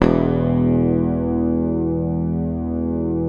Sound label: PICKED, Bass, E1; Sampleheads; Will Lee Bass Library